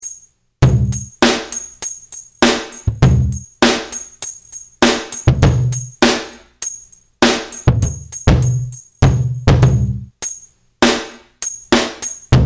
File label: raw agressive break